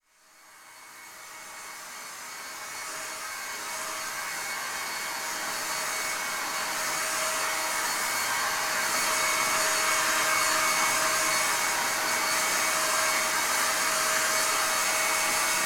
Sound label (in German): Traumhaft Schlafen mit beruhigenden Geraeusche